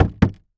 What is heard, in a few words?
Foley Footstep Golf Cleats Jump On Wood Platform Quick; Foley Footsteps